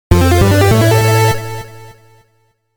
Powerup; PPPPPP Soundtrack

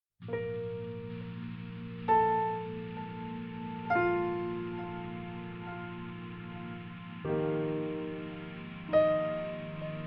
Clouds (Pause Menu)

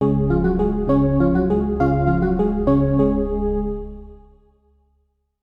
Sonnerie Collège France; MIDI RIP

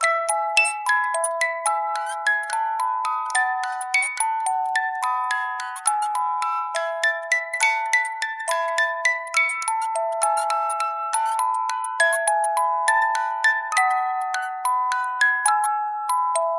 Music Box

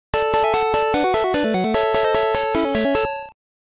Fanfare Victory; Tetris (Game Boy)

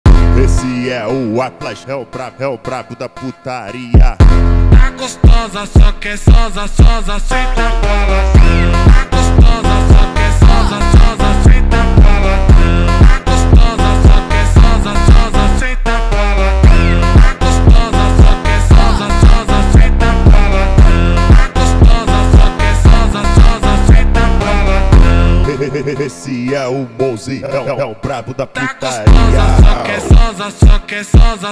montagem slowed

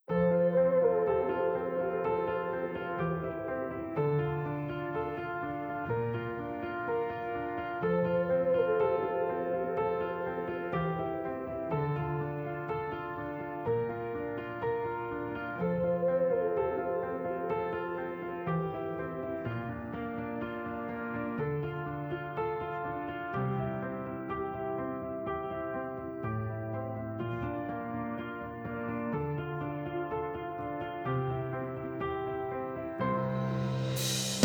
Karaoke

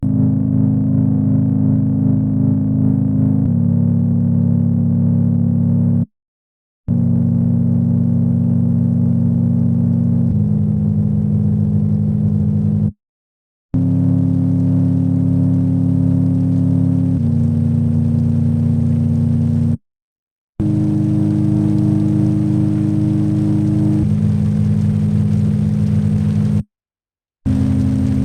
Rain

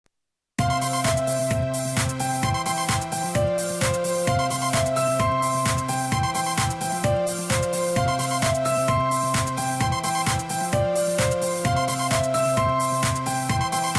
Ringtone; T-Mobile

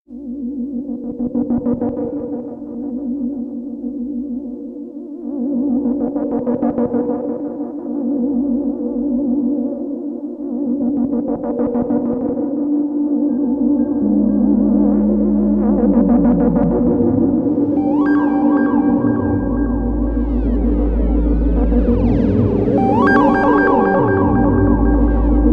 Sci Fi Scanners

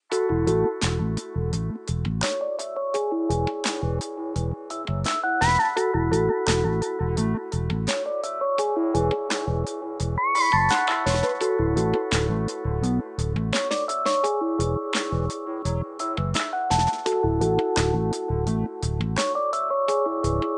Moon Discovery Bass; Samsung

